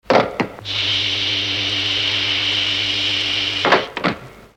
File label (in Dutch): Binnendeur; Journey into Space